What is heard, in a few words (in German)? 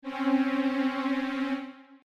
sekund, Die kleine Sekund